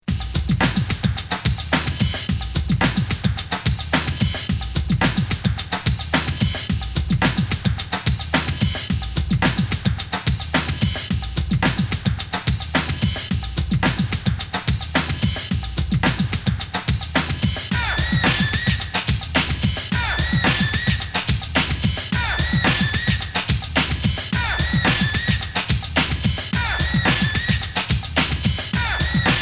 beat

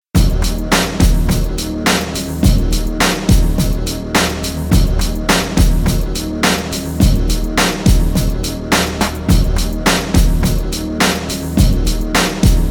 dub loop track bpm 105

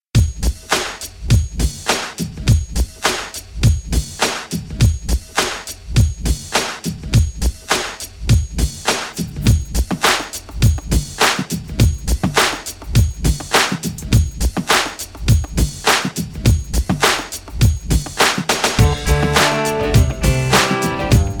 bpm 103; classic mini mix

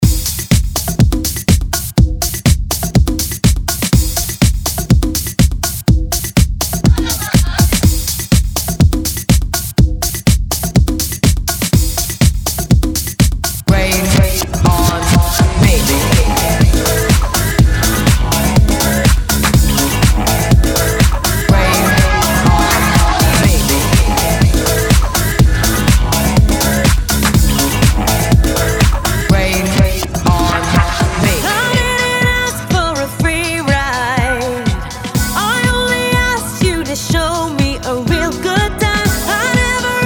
bpm 123

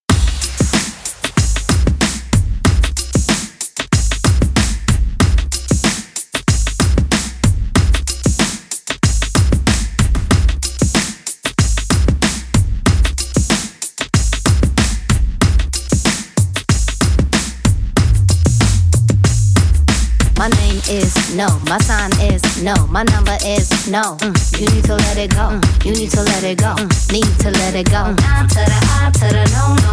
bpm 94

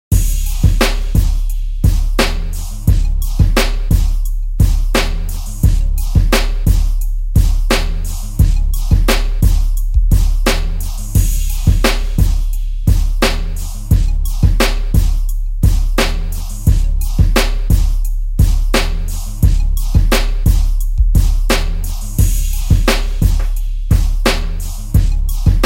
independent beats, bpm 87

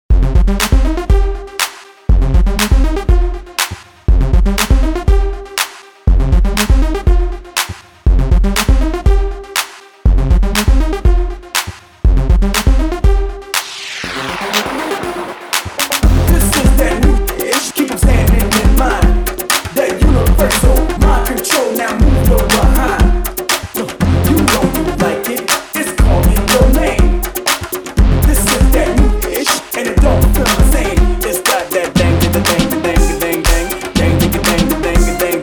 mini mix bpm 120

